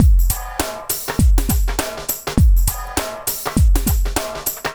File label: boomin; Spectrasonics; Liquid Grooves 1 & 2; BR.SHUFF